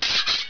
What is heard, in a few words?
sword